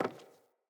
Sandals Walk Wood, Footsteps

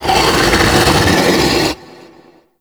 Brawler Rock Elemental Cast Vocal; Warframe; Warframes

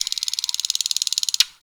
Forest Bird Rattle; Warframe; Grineer Forest

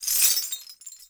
Movement Dash Chains; Warframe; Uriel

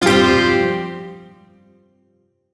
Shawzin Pent Min Chord F; Music; Warframe; Duviri; Chords

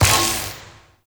Vial Ability Magnetic Shatter; Warframe; Warframes; Alchemist; Elements

